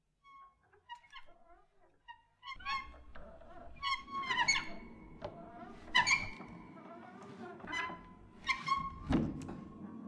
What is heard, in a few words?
Squeaks Thumps, Piano Destruction